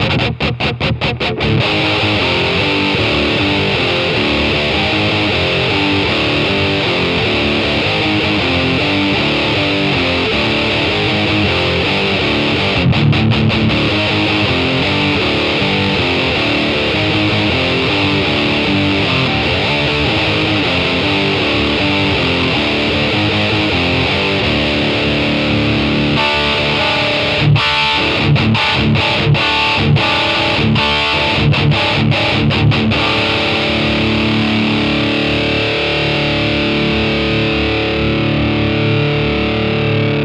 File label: jcm900